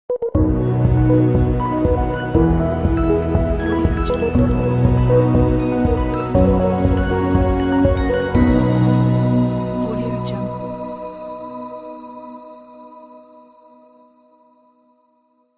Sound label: nice soft logo